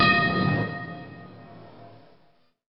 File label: box bell